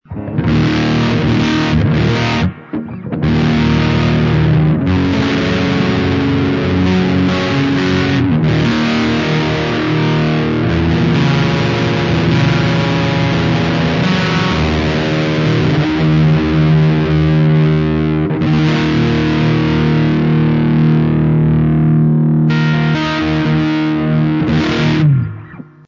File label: chords; mescalito; gear